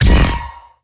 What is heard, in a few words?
ninja sword